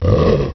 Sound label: gurp; quake2; fwwolf